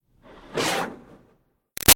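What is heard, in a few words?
Water Spray Interior Car Grill Fast; Car Wash; Spraying; Objects; Big Room Sound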